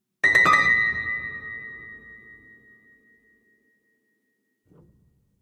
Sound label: piano notes; SyrinScape; Madness in the library